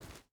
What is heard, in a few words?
footstep rubber boots stones jump start; Rust